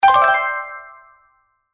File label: Small Reward